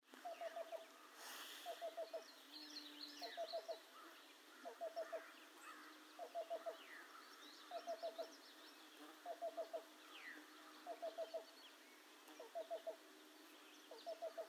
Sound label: Black-billed Cuckoo